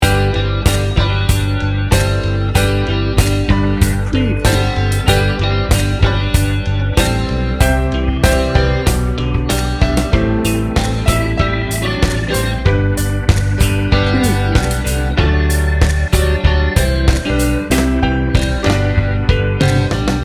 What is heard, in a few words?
slow dance loop